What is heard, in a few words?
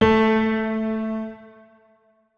notes, keyboard piano; Hideout Furniture